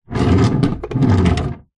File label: household drawer wood pine open close